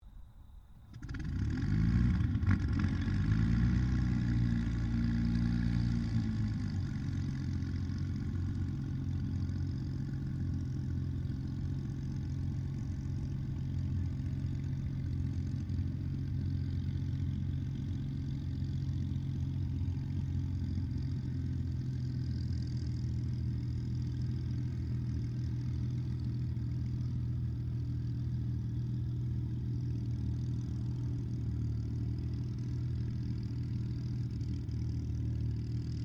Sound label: 1944 SUPERMARINE SPITFIRE MK-XVI STARTUP IDLE DISTANT